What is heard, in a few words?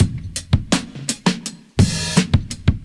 click bang, Boombap, Drum loops